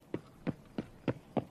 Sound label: loud footstep